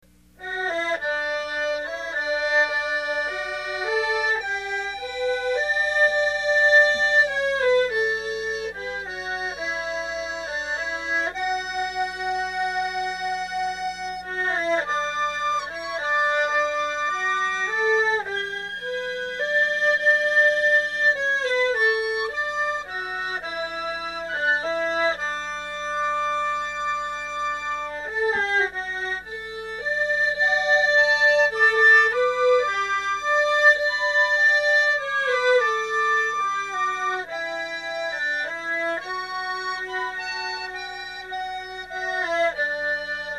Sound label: erhu dizi